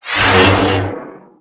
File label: saber throw; MOTS